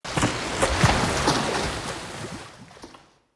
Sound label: Corrupted Sap Waterfall Start; Puzzles; BeanStalk